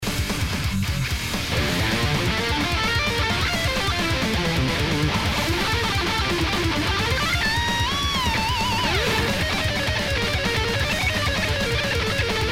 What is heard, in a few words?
solo